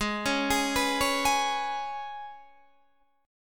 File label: G sharp Minor Double Flat 5th G sharp, Guitar DADGAD